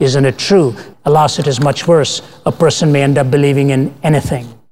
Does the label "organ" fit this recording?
No